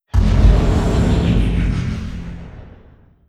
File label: distance neutron fire layer; apocalypse